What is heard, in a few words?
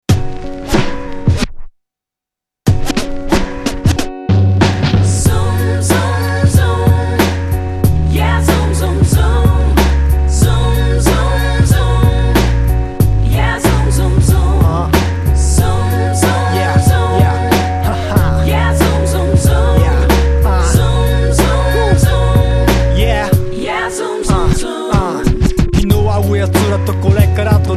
hip hop